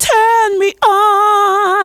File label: VOX; Zero G; Man Machine